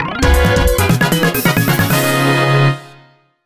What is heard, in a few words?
Race Start Fanfare Battle; Mario Kart 7